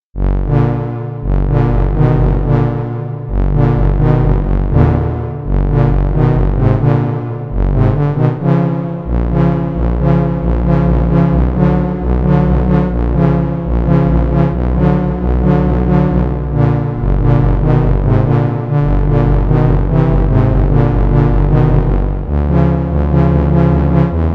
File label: prelude for synthesizer in 13 equal; 13edo